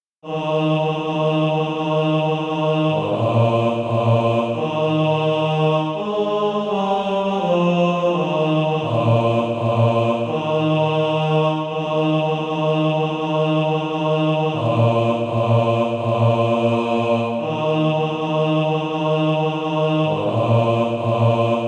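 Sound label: bass, SATB Practise Music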